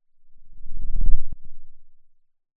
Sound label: Sound of a lion’s roar